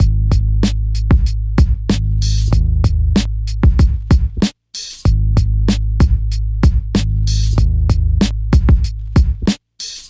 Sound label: Hip Hop Raw